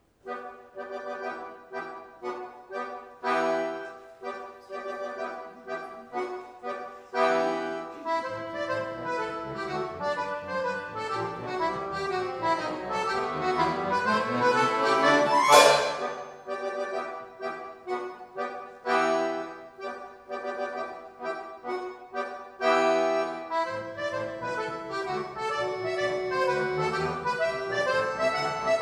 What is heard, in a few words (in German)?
Marsch; Zinnsoldaten